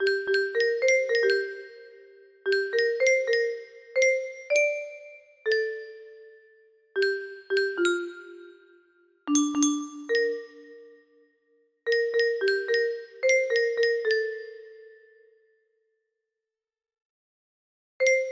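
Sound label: xylophone, music box melody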